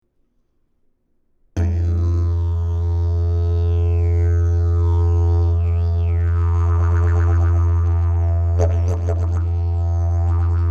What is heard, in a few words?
didge